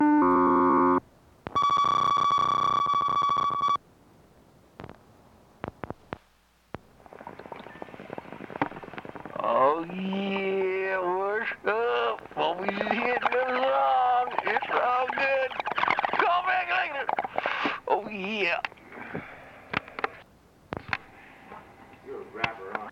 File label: bong hit